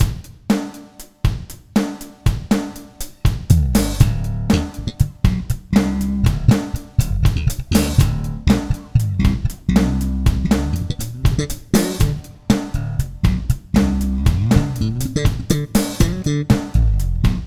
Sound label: SLAP